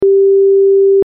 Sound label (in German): Sinuston 392Hz; f=392 a=0.6; Psychoakustische Grundlagen der Musik